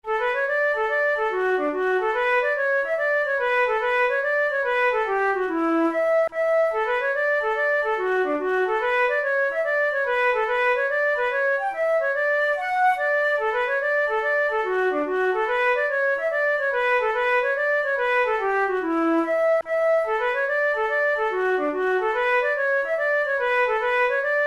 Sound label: hornpipe, flute